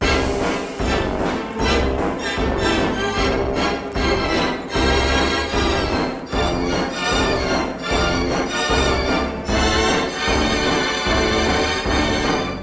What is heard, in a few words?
music emotes; fortnite; athena